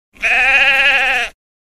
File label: sheep